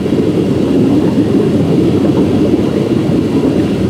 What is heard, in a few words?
Water Through Pipe Large; Ambience; Warframe; Grineer Galleon